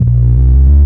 Jazzy Bass - 10A; Jungle; Blu Mar Ten; Jungle - 1989 to 1999

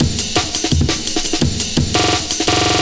Amen; Jungle; Breaks; Blu Mar Ten - Jungle Jungle - 1989 to 1999 Samplepack

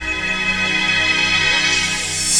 Tear Lead, 1B; Jungle; Blu Mar Ten - Jungle Jungle - 1989 to 1999 Samplepack

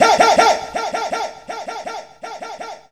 Dream Hey, Vocals; Jungle; Blu Mar Ten - Jungle Jungle - 1989 to 1999 Samplepack

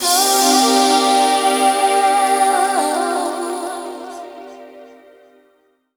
Careless Synth and Vox; Jungle; Vocals; Blu Mar Ten - Jungle Jungle - 1989 to 1999 Samplepack

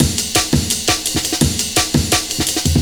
Reel Funk Amen, Jungle; Breaks; Blu Mar Ten - Jungle Jungle - 1989 to 1999 Samplepack

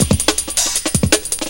Physical Break; Jungle; Breaks; Blu Mar Ten - Jungle Jungle - 1989 to 1999 Samplepack

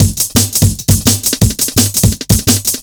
Chopper Break, 6A, Jungle Breaks; Blu Mar Ten - Jungle Jungle - 1989 to 1999 Samplepack

